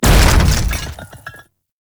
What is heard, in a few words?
Loot Crate Large; Tau; Tau Circles Of Hell; Loot Crates